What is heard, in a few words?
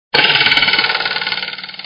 Twang, cartoons